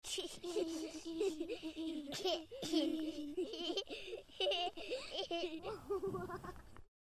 kichernde kinder

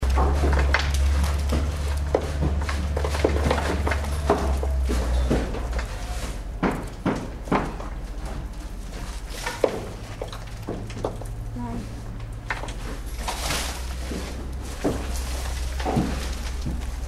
baustelle staubt

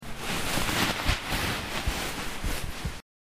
sitzsaecke rascheln

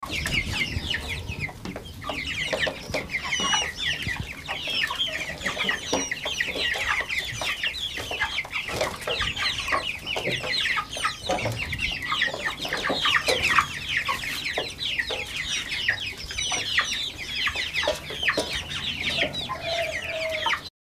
Küken; Mehrere Küken, die durcheinander piepsen; Kategorie: Tiergeräusche; Bauernhof Thalmassing 2017

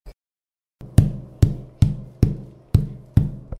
pochen

